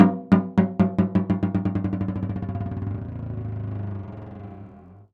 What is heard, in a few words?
Prepared Drums